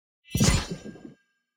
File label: symbol remove land; reef raider